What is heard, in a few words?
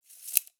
side menu slide in, ui; Counter Strike Model Pack; CS:GO; panorama